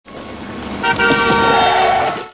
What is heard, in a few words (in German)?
hupen